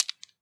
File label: Marbles Rattle